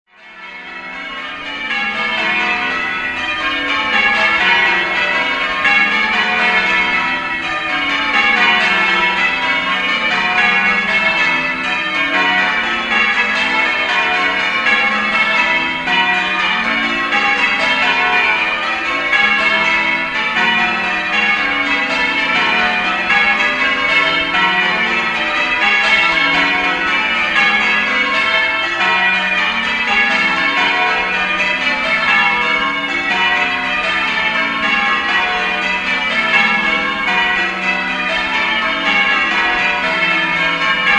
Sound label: Great Milton Bells